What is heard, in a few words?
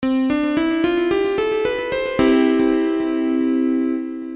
Nearmajor